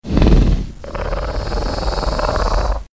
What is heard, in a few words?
velociraptor breathing